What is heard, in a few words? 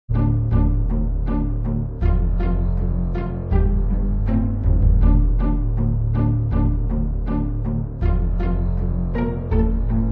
cinematic trailer rock remix